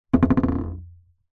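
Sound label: leisure ball rubber gentle bounces fast on hollow wood surface; zapsplat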